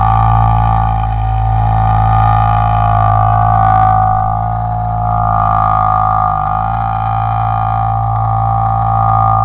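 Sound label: teleport working; avalon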